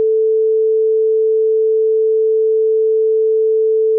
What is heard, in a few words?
toot